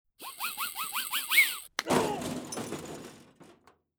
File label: human burping; sweeps-swells